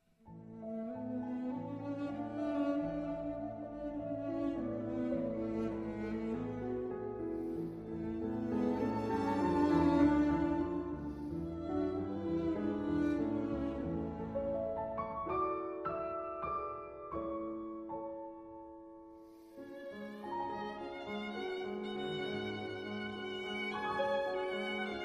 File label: Piano Trio E Minor Andante; Dvorak; Op 90; Dumky 3